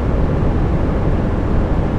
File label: suck